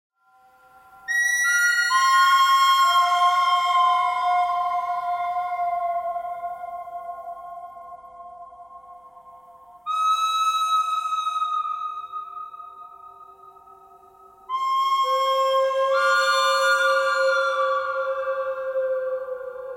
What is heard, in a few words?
bird cry thread